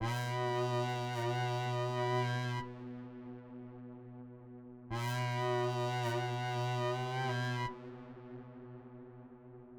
Synth; Hip Hop; Big Fish Audio

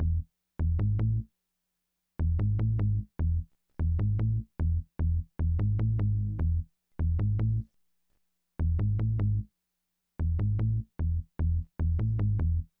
Bass; Hip Hop Philosophy; big fish audio; Apple Loops

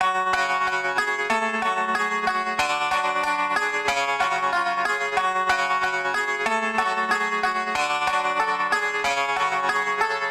Tzoura; Hip Hop Exotica; Apple Loops; Big Fish Audio

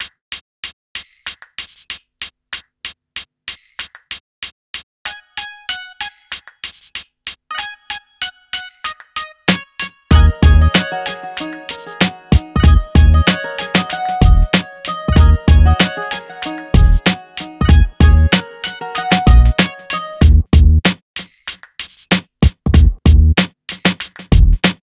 095 Ebmin, Hip Hop Exotica; Apple Loops; Big Fish Audio

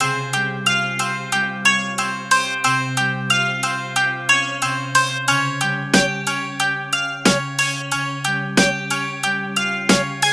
091 Cmin, Hip Hop Exotica; Apple Loops; Big Fish Audio

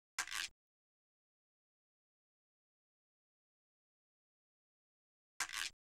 Guiro, 092 Cmin; Hip Hop Exotica; Apple Loops; Big Fish Audio

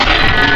Metal; Cymatics; Foley Wavetables Vol 1; Serum

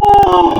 Rooster; Cymatics; Serum; Animals for Serum - Gold Edition

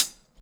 Hi-Hat, 075 Dmin

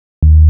Bass, Hip Hop Exotica, 100 Dmin; Apple Loops; Big Fish Audio

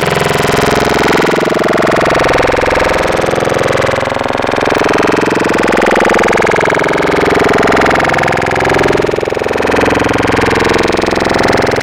Balloon, Growl Tables; Cymatics; Growl Tables Vol 2; Serum

